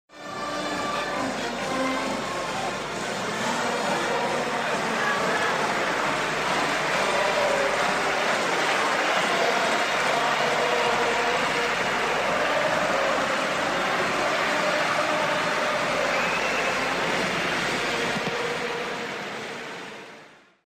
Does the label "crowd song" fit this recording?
Yes